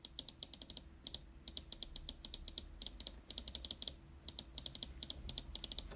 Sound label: KAILH GM 8; Zircon XIII